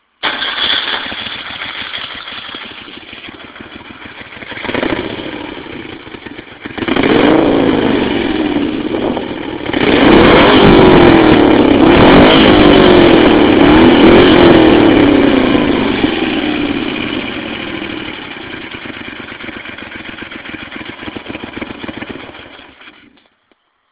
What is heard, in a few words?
Guzzi LM2